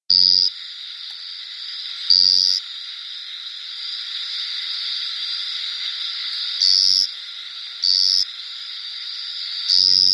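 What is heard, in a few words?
Allophryne ruthveni, frog; guyane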